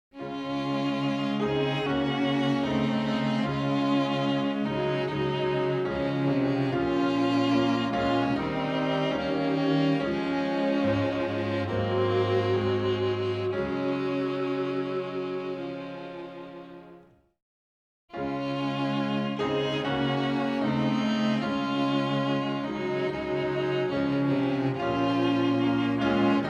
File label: music only